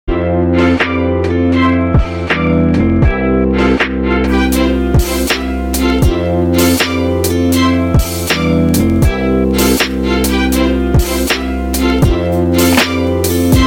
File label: Chill Study Beats